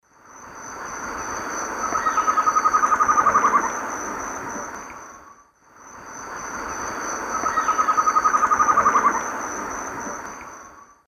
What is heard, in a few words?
Thamnophilus amazonicus, song female; S. America; Eastern Lowlands